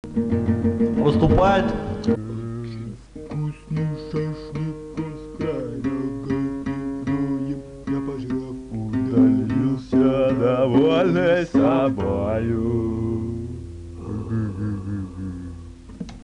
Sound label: necrophagy acoustic